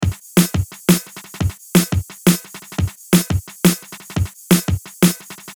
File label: Beat